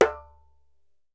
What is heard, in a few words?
DJEMBE, African Perc